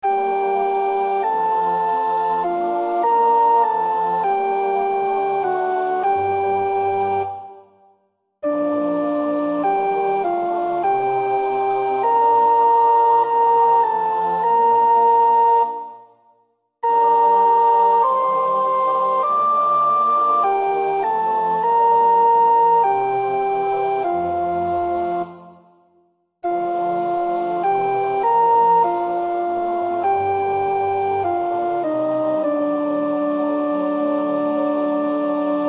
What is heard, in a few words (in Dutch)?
sopraan; Nieuw Liedboek; NLB 100